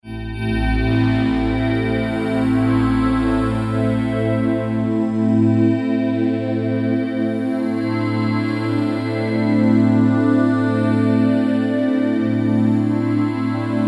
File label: Korg Wavestation A-D